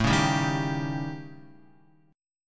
A 7th Flat 5th, down, Guitar; A7b5 strummed; JGuitar

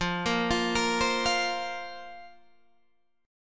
F Suspended 4th F, Guitar Half Step Down; Fsus4

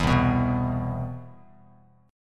C Major 7th, Bass Standard; CM7 strummed

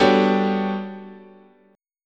G Major Sharp 11th, chord

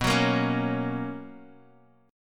B Major 7th Suspended 2nd B down Guitar Open D

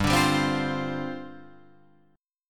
G 7th Suspended 4th G down Guitar Open F; Listen to G7sus4 strummed; G7sus4 Chord

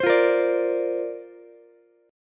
D-Minor 7th Sharp 5th, D, Ukulele; 17,17,18,17; Dm7#5 strummed

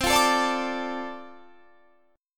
F 6th C, chord

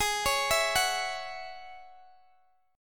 Ab 7th Suspended 4th Sharp 5th, Guitar, Open D; x,x,18,19,19,16

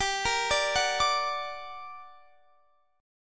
G Minor Major 7th G down Guitar Drop D

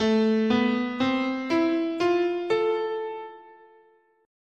A Hirajoshi

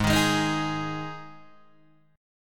G sharp 5th G sharp Guitar DADGAD

